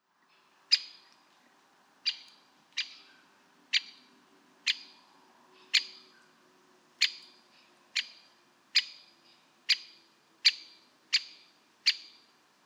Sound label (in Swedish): större hackspett Dendrocopos major lockläte